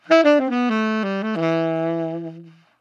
Saxophone 85bpm Am Chill Smooth

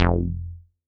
F Heavy Bass

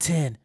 Double Vocal, D

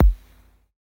Kick Dirty Hit

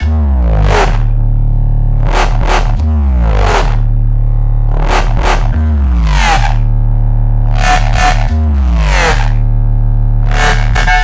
174 bass D full

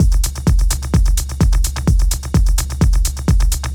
128 drum loop dated full

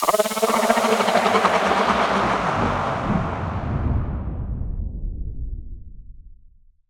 Sweep Down Vocal

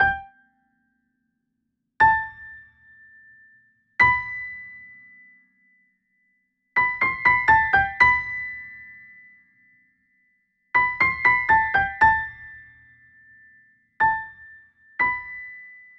120 piano top G maj; hokkaido

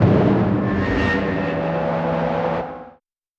Dark Fx

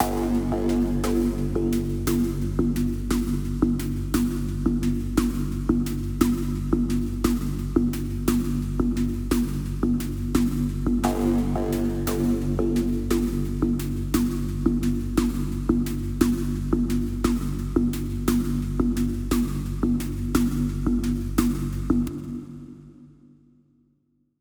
SEQ DnB

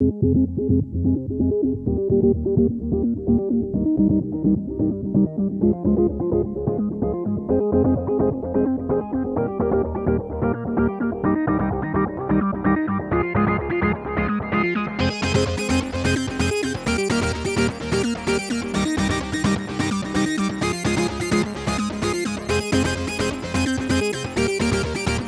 Leads Muted 128bpm G; Evolver

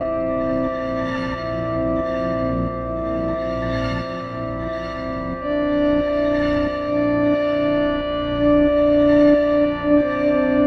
90, C, Atmos; Thought

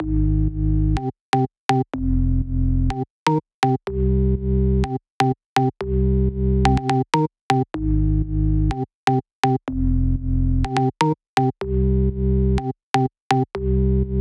Bassline G 124 Dry

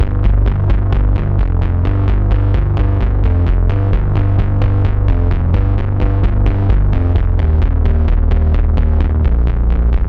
130, bass fuzzy pulse tremolo, G min

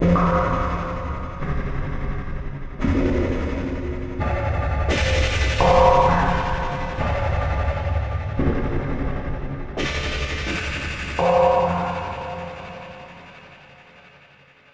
F-Major Atmospherics, 172, with tail

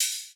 Hihat Closed Short Hit

